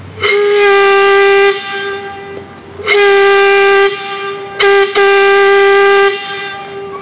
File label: organ, steam